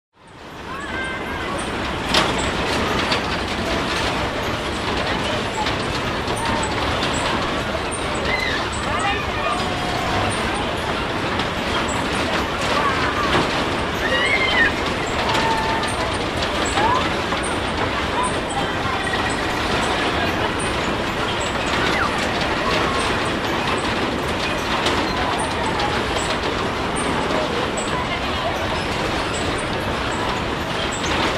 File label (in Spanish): parque de la independencia park sonidos coctelera